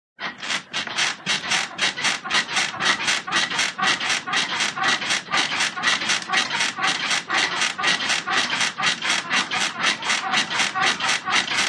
Bed Creaky Old Wooden

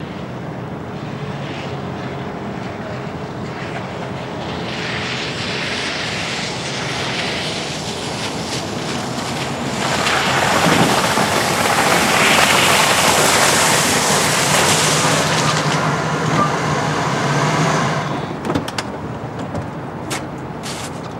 Car Stp Off Snowy Road; snow tires

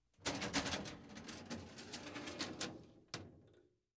Metal File Cabinet, drawers

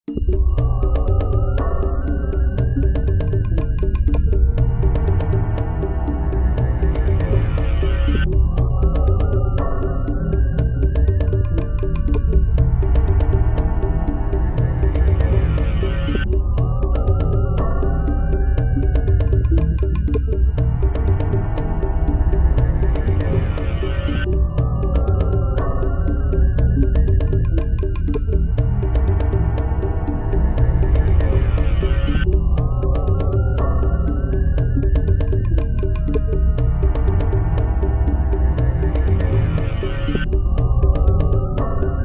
Music Bed Eerie Ambi

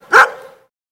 Dog Bark Generic Clos

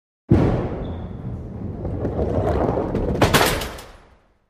Bowling Ball Rolls

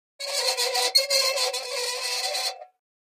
Metal Scrape On Metal

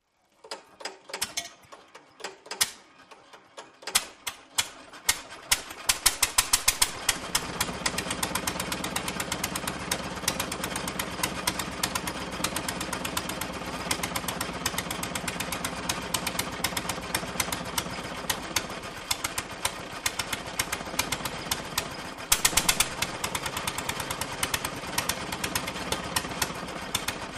Diesel Wheat Grinder

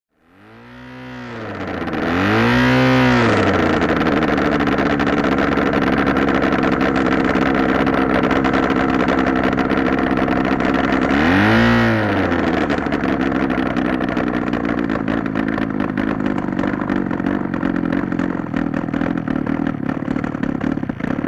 Gyro Start Take Off

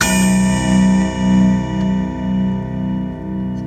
Grandfather Clock

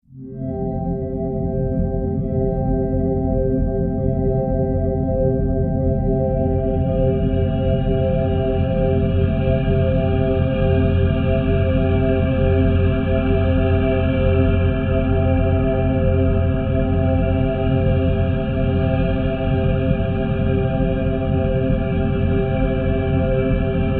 Steady Bell Tone, deep

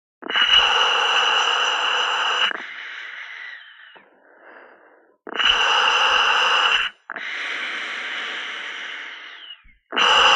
Aqualung Dry Breaths, Normal Breathing; Aqualung Shot Dry. Normal Breathing, Then Faster Towards End.

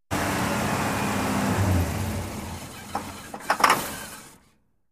Car Cough Off

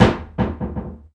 Cabinet Door Close With Bounces, Ambient

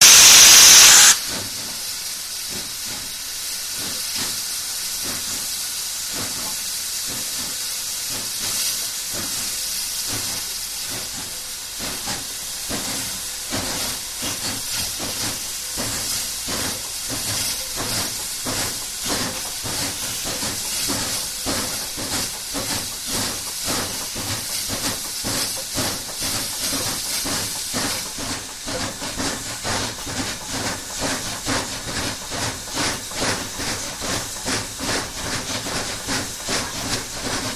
Challenger First, steam train

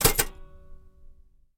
Typewriter Shift Ke

